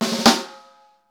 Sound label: snare; Club-50 - Foundations Roland; Ext.Snare 2